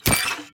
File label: Bloodbank Spawn